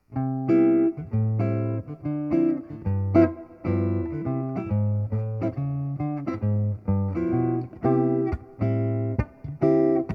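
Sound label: ryan thorell port orford cedar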